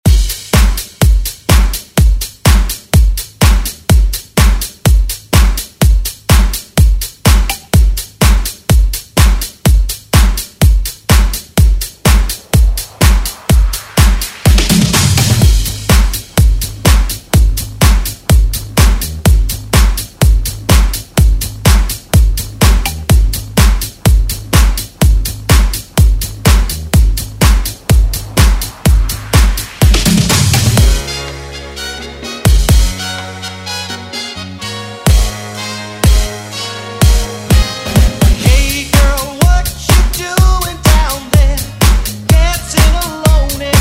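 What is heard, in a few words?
70s Extended Redrum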